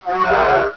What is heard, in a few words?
pain; svencoop; cryocell